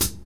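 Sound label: hi-hat, Techno Rock; Northstar; Drumscapes Roland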